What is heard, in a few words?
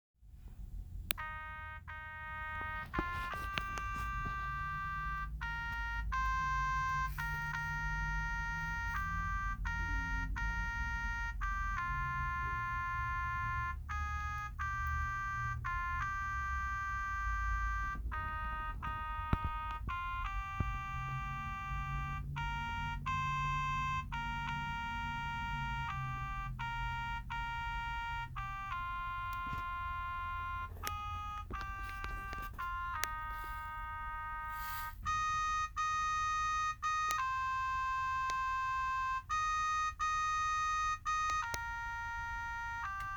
Soprano